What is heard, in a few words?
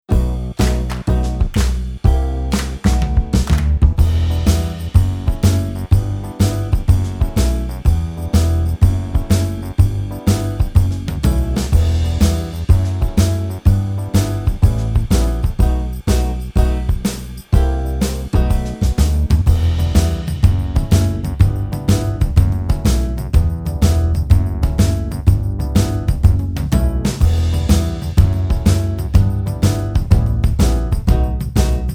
backing